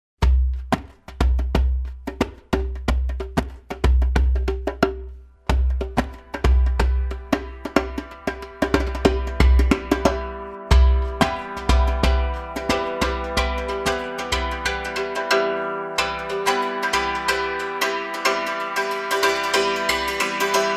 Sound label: Percussion Guitar